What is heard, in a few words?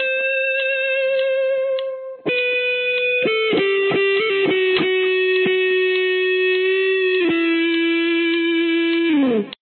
lick, guitar